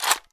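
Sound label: sounds of weapon ssg 08; iz igry cs go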